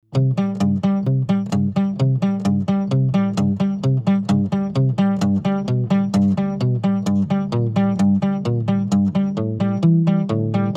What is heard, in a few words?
karaoke